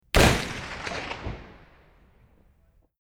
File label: mosin distant